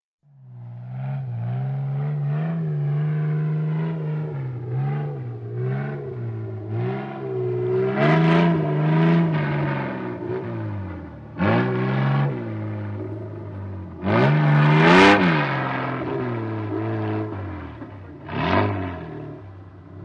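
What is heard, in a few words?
approach, drive by and drive away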